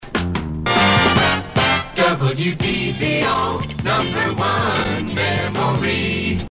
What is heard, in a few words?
jingle